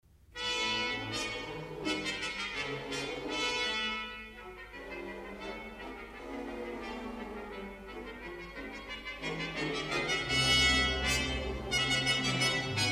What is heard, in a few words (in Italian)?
trombe sordine; tromba; strumenti